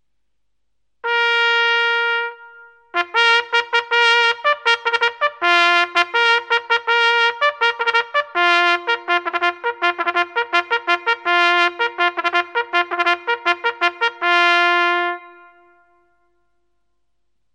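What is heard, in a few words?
signals, bugle; pobudka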